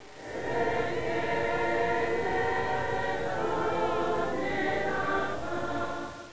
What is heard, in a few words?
choir; Tonga